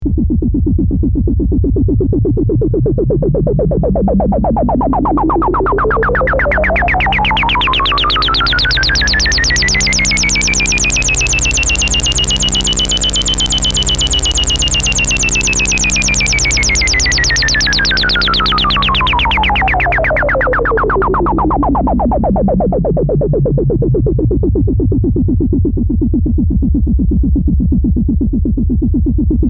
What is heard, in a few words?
full res adsr lfo LP; state var vcf; analog synth